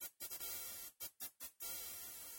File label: 1-Up; Cool Spot; Genesis